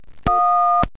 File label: tone